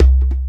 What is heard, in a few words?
Djembe Hit; Ambient Grooves